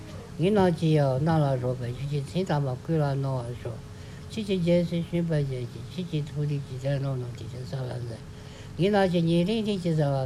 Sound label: Lord's Prayer tibetan; Cizhong